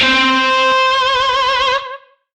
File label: Shawzin C; Warframe; Lizzie Shawzin